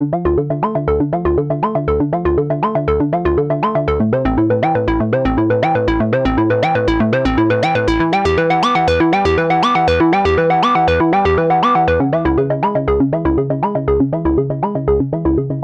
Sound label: Dance Synth Dry; London; dist tube culture